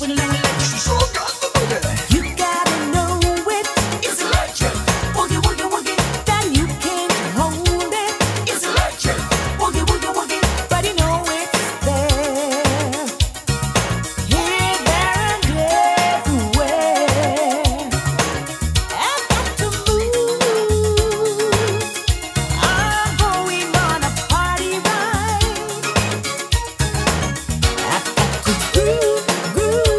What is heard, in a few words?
reggae